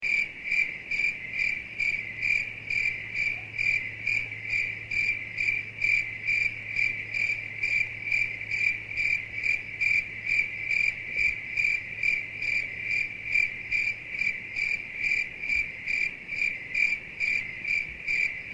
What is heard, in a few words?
Cricket Chirping; LG LN272 Rumor Reflex